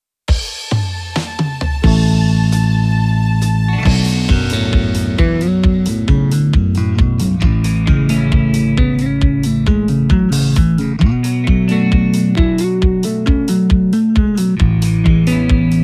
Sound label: Finger Alusonic Custom Shop JSP DX 4